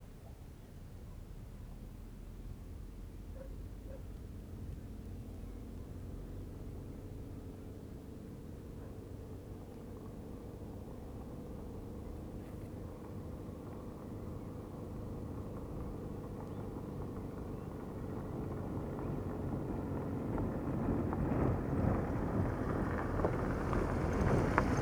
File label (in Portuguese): Caminhao passando em cidade pequena com passaros; maquinas